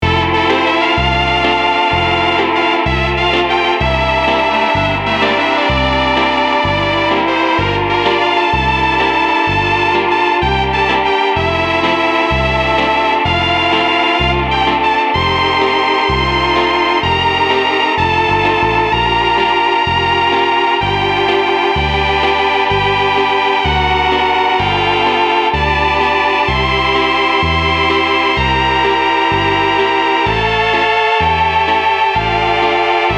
novachord solovox

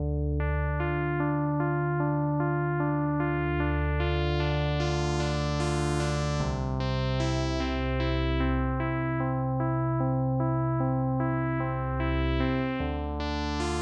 D arp loop

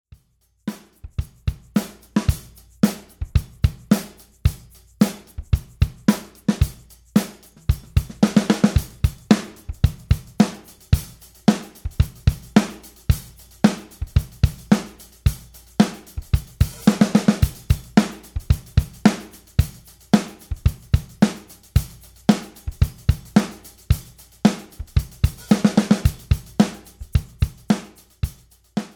80s pop

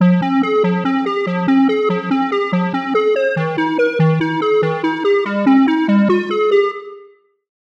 lead square; quantum

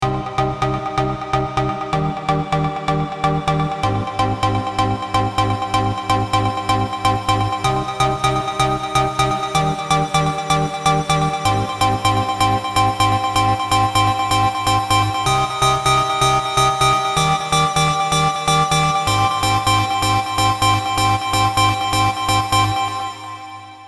mega pulse lead

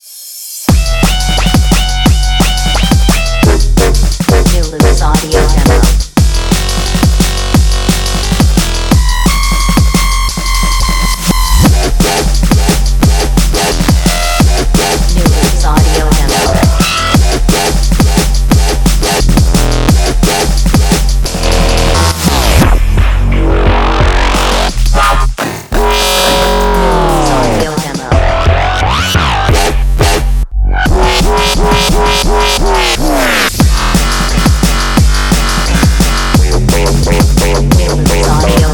hard bass dnb

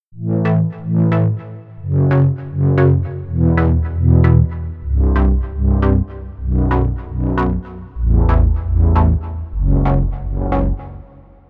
chord holdchord shorty

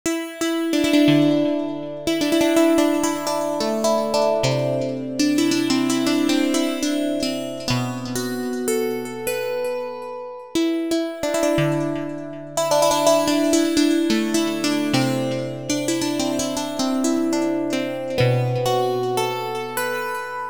bell new digital age bell

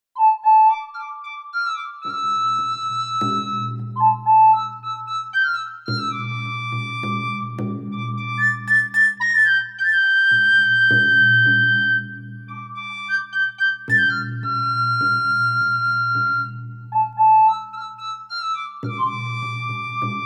medieval whistler

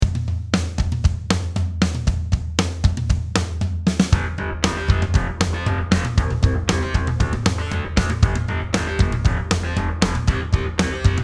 rock; re4orm

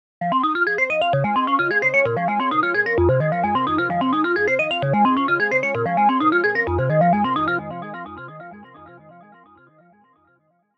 bell; digi

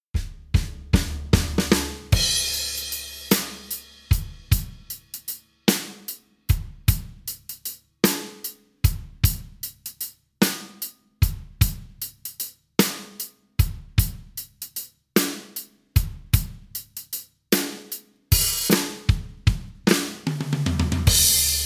12/8 slow blues

